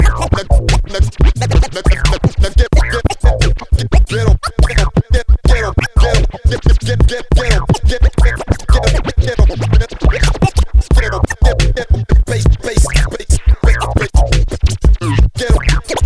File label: ghetto bass